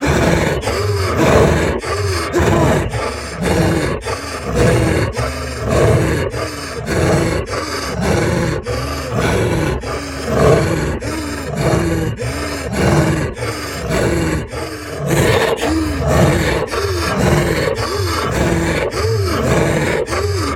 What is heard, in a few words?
Chase High; Devour